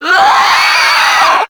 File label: Infested Crawler Attack Short; Warframe